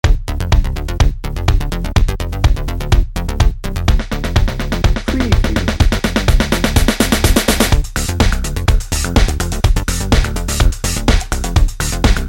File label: trance dance romance dnb